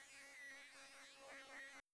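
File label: Bee slow change tempo and frequency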